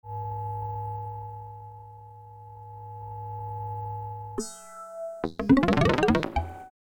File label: Buchla; buchla 200; buchla modular